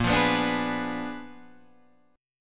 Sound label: Bb 7th Flat 9th Bb down Guitar Open D